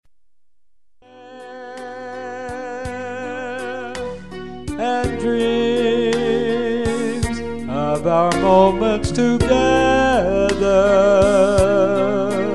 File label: saxophone